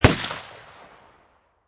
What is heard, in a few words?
Pistol Rhuger 44 Magnum Single Shot Exterior At 45'